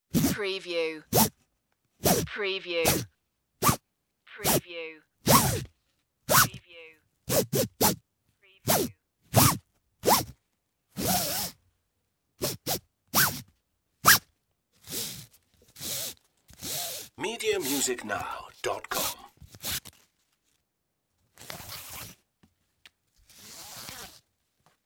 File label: tension belt use